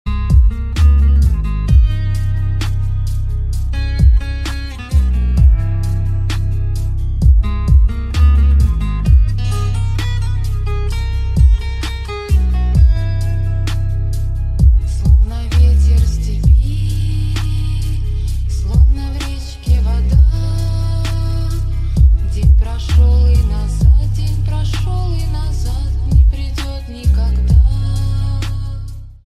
bassboosted remix

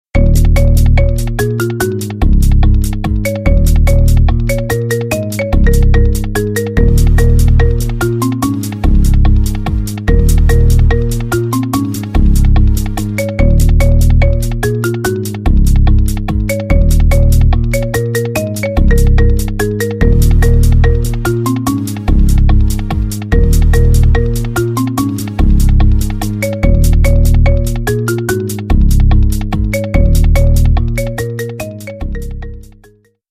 marimba remix

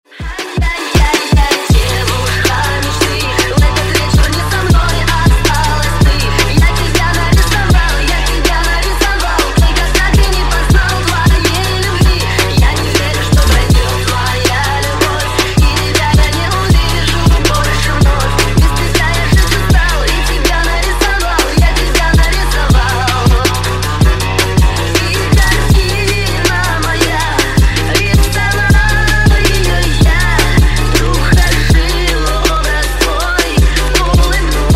phonk remix

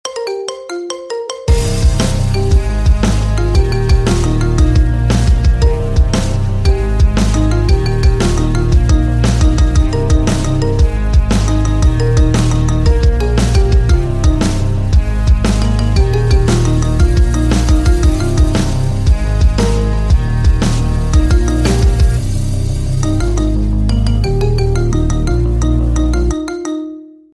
marimba remix

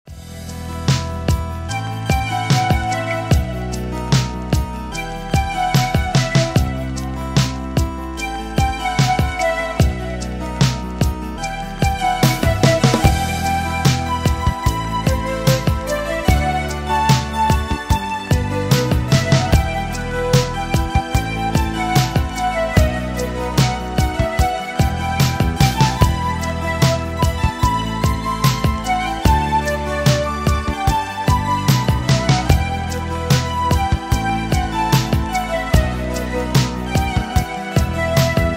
instrumental

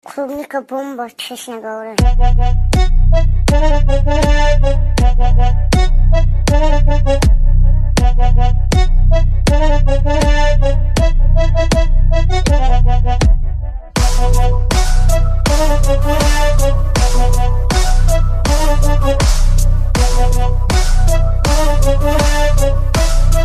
bass boosted remix

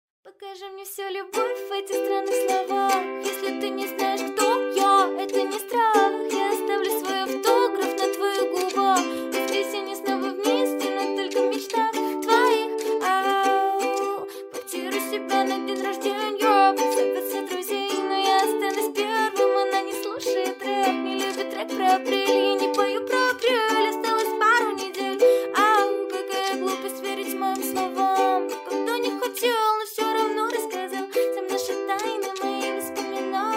ukulele cover

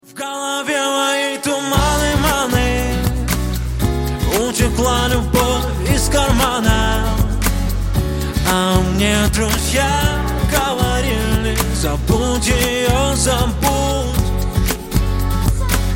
acoustic

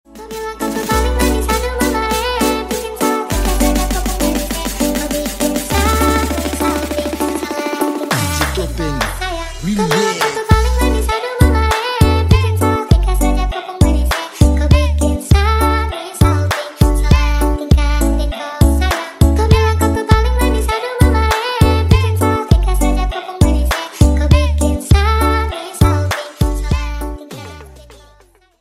remix tiktok music

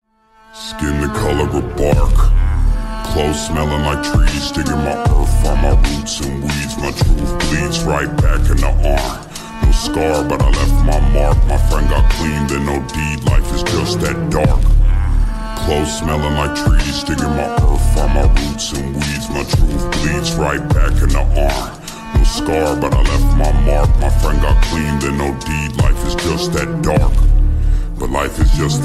slowed reverb

